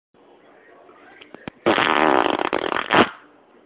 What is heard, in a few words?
fart